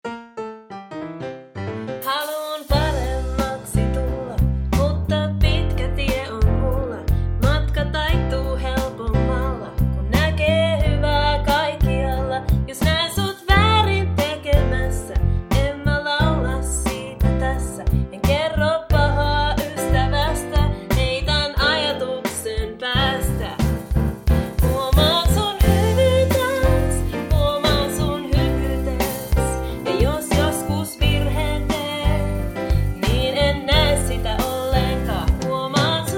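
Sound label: Song, Finnish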